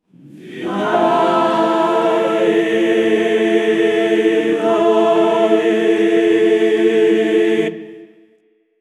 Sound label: gregorian chanting